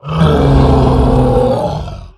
Ultrasaur Death

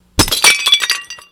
Plate Death

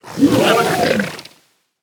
Corpse Flower Death; Sherazin Corpse Flower

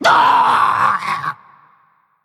Female Elemental Death

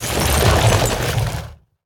Quartz Elemental Underlay Play

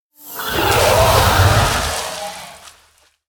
Death; The Lurker Below

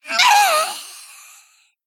Female Gnome Death

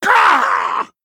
Male Gnoll Death

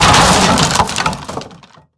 Death; Dragonbane

